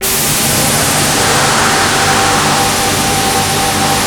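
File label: G4 noise; Northstar; The Wizard VOL-1; MYSTERY BOX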